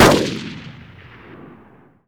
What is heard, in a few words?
colt m4 ext shot